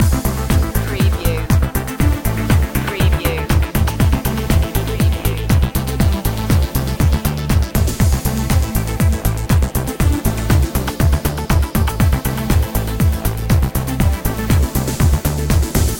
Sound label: neutron groove loop